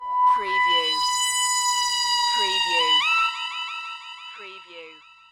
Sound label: Hip Hop Synth Licks 90 BPM - B